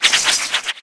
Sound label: weapons twirl sword